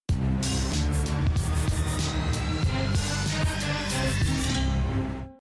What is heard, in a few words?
mission passed; gta iv